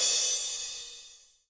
soft hit finish

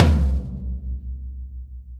TOM; osu

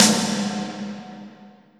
Impact snare

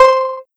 soft hit whistle; osu

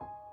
piano high

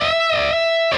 Bend full Release Bend

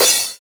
Cymbal short

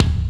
Kick

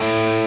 Arp.

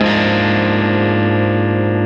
x 2 2 2 x 3 Arpeggio down A-G

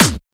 kick

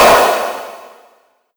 snare hollow

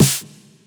Snare Wet Echoing Hollow

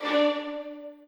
strings

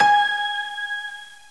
piano

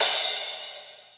drum hit finish; osu

